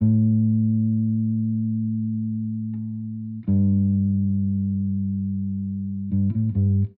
bass, Loops

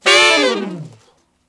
Stabs

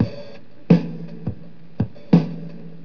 Drum loops, Boombap

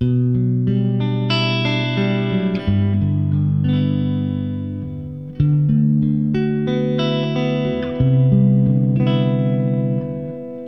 guitar, Loops, Sad

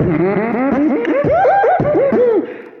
big goes up, Beatbox; Drum loops

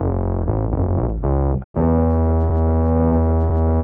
bass; Phonky

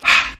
breath hardy exhale; ooh ahh

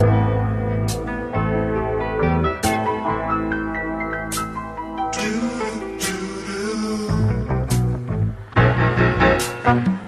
horns, phonky loops

